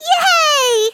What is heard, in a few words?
"yay"